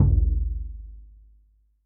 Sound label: Big Boy, Kick, Yamaha DTXpress-II